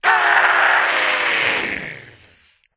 crawl; zombie; zombie plague

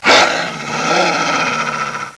zombie die

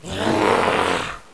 idle, zombie; cstrike; Zombie-land

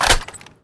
xm8 mag in

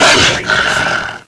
monsters pain; oblivion darkangels

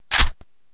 ak74su clip in

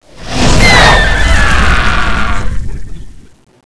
monsters spawn; oblivion darkangels

